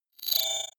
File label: sweep rattle click; sweeps-swells